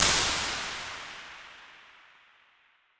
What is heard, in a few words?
St Nicolaes Church